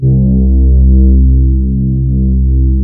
MELLOW, YAMAHA; Analog Fantasies; USB Soundscan vol.49; AKAI

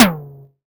Synth Tom Mid, Percussion Hits

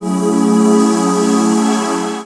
C, Pads; Zero-G; Total Drum Bass

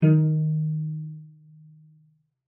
cello pizz D#3; 90 s Sample CDs; Xsample VOL-5

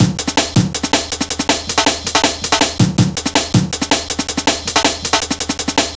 Illegal, Drum Loops, Jungle, 161; Jungle Warfare 1&2

